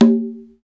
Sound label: conga, Roland; Club-50 - Foundations Roland; Hybrid Kits; Thin 5